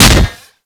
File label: Impact Metal, Weapons; Warframe; Furax